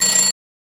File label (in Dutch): deurbel